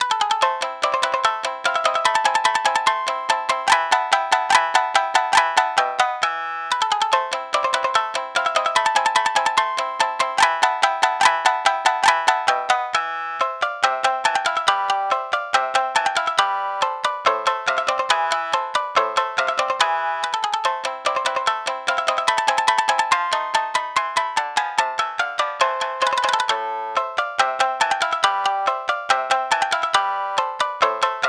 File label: shamisen